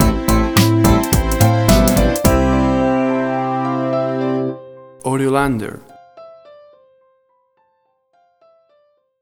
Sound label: 80s Cocktail Twist Stinger